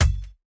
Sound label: Weapon Rubber